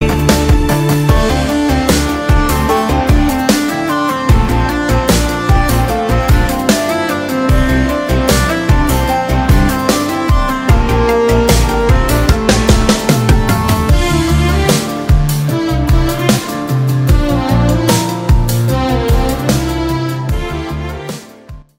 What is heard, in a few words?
Instrumental